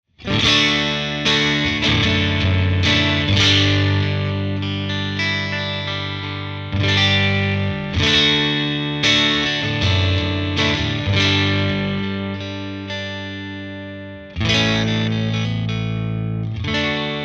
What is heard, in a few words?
amp studio dist; guitar